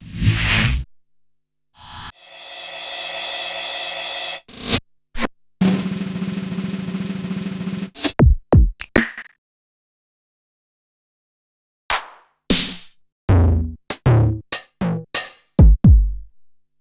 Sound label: Yamaha RM1X